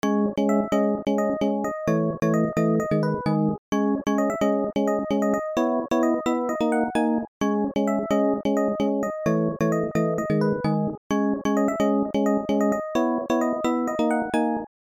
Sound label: 128 G Hook